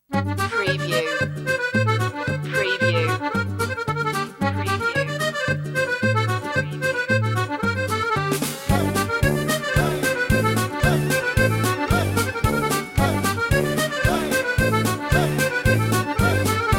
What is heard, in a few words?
russian polka